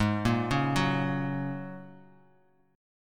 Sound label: G sharp 7th Suspended 2nd Suspended 4th down Bass Standard, chord; G#7sus2sus4 arpeggiated; JGuitar